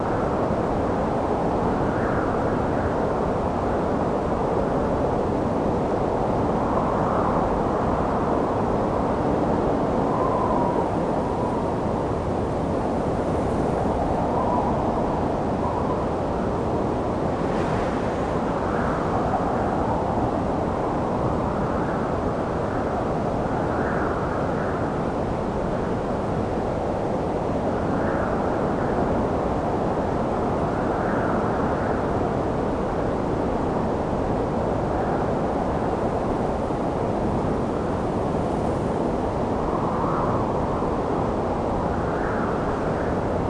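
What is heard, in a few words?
ambient chasm; Star Wars Bounty Hunter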